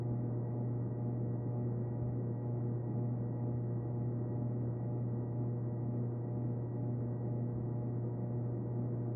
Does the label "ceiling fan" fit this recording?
Yes